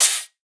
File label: Hihat, Psytrance; One Shots